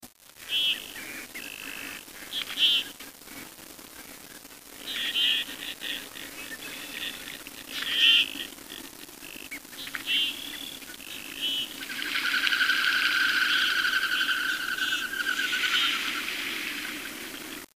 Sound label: goldeneye